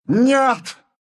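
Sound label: death, Russian; dota2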